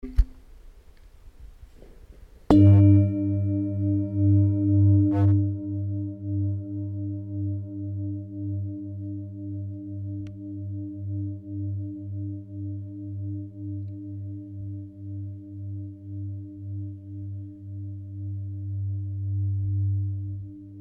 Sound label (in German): Klangprobe Klangschale